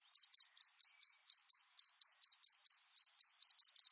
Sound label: gryllus campestris